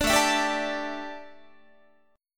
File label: A 7th Suspended 4th, A, down, Guitar; A7sus4 strummed